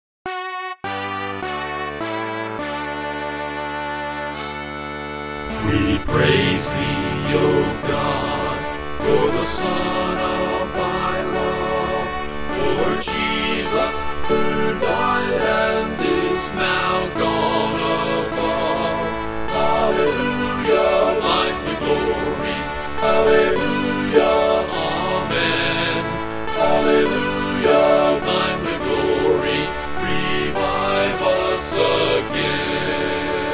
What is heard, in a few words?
Revive Us Again Vocal